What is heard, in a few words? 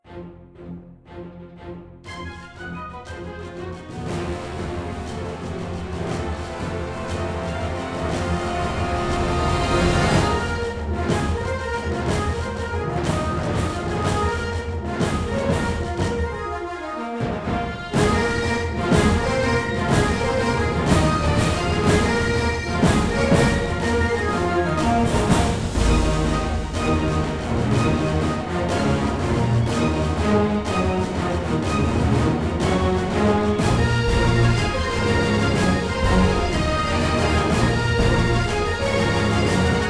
LOOP multiball; DW How to Train Your Dragon